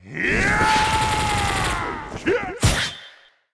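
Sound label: ghost captain attack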